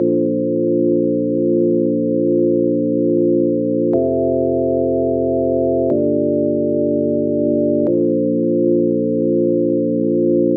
Chord Progressions Melodies Amaj Gmin Tonic A, 122 BPM; mmb studio melodic